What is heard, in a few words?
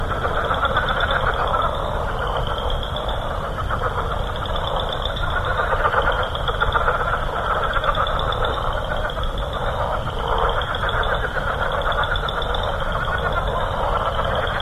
chorus, purple martin